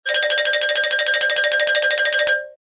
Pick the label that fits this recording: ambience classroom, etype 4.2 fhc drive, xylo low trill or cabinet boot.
xylo low trill